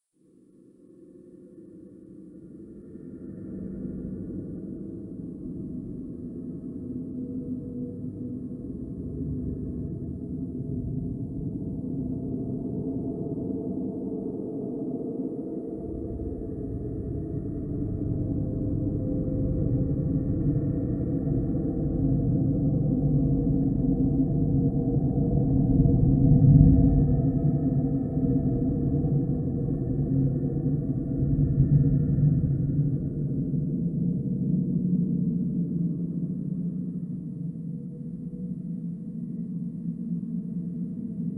sitting idle; five nights at freddy s

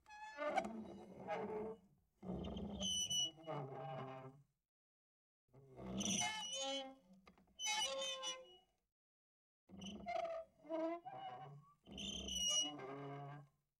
loop screen door squeaks; pineview drive

